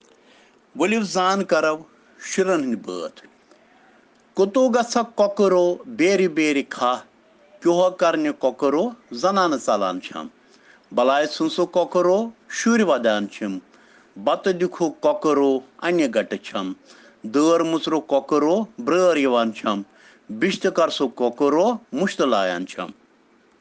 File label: Children Rhyms in Kashmiri